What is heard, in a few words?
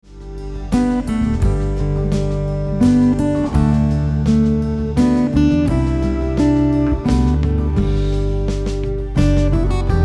Come Thou Fount of Every Blessing arrangement, guitar; hymns and gospel songs on guitar